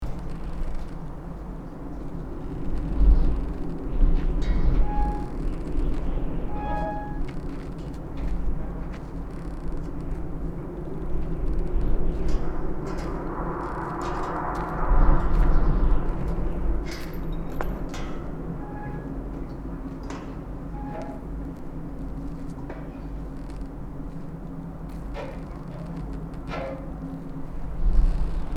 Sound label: Ghost town ambience exploration loop